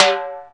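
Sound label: RX21 Timbale Hi, Yamaha RX-21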